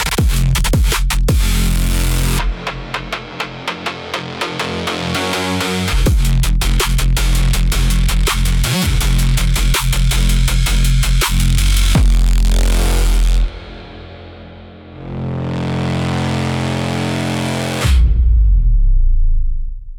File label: Cracked Siren Run, aggressive panic